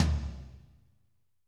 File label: TOM, Toms, Roland; Northstar - Drumscapes Roland